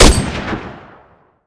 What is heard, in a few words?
R700 Shoot; Modern Weapons Pack